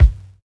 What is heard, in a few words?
phat kick single hit g key